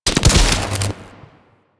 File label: Ocean Electrified Water Start; Ambience; Warframe; Grineer Ocean